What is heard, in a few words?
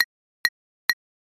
crisp click, ui sounds; mikroconsult